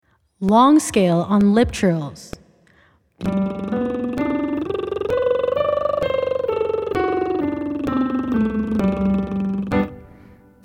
lip trills one and a half octaves high range, vocal exercises